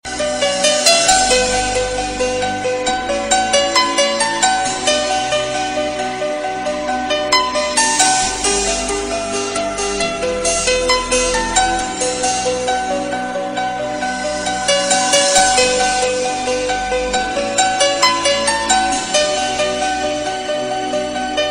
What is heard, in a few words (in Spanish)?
el piano mas grande